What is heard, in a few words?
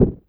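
snare drum, Drum & Bass; Ueberschall; Drum & Bass Resonance; 168 BPM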